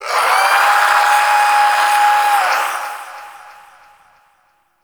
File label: Shadow Vocal Idle; Warframe; Duviri